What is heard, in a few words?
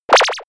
spring effect; swooshes-swishes